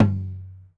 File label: Tom Acoustic Crush Mid